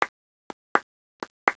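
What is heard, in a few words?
rhythm clap, 人群; 人群系列声音素材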